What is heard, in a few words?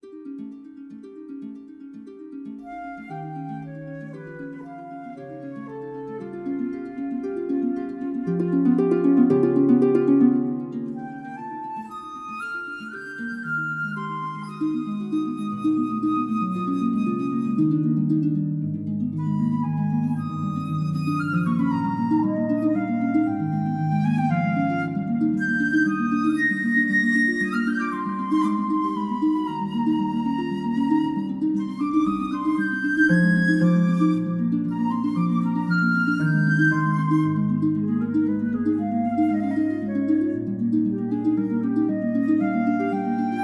Short Piece for harp and flute